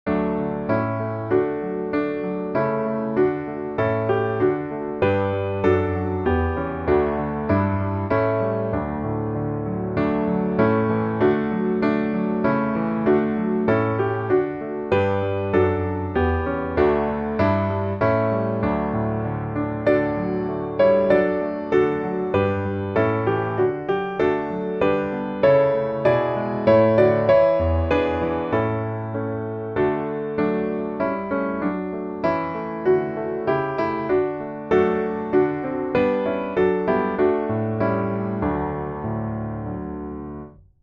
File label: piano